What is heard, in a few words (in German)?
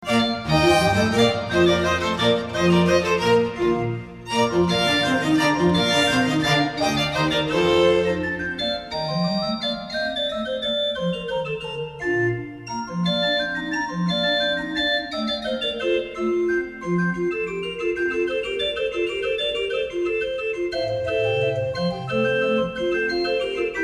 Truhen Positive; Digitalorgeln